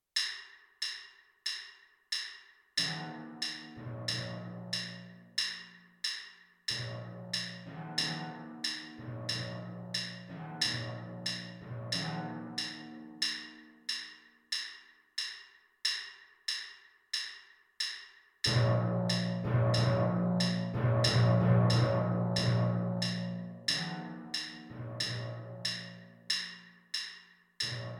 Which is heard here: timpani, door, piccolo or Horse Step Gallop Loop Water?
timpani